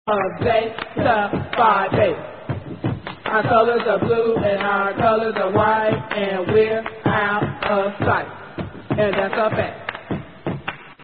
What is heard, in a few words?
Zeta Beat